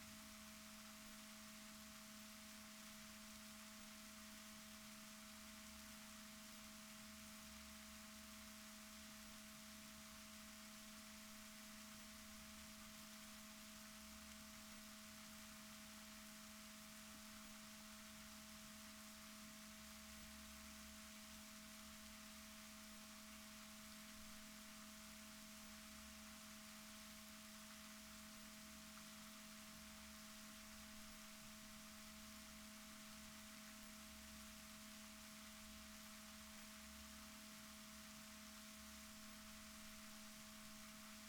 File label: rorquals negatif, Balaenoptera Physalus Chile